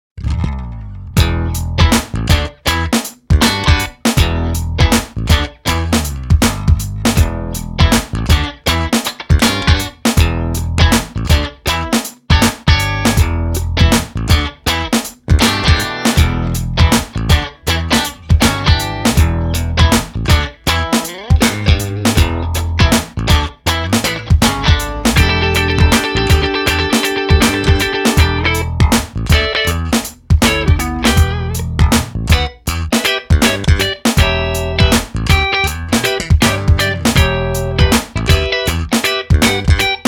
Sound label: Funk